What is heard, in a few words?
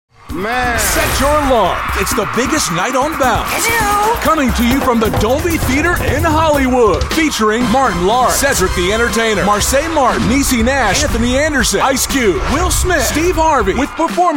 trumpet